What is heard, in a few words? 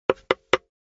knock notification ringtone